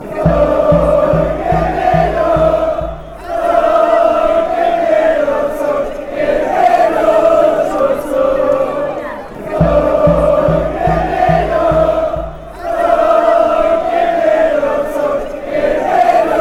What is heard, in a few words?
huracan chant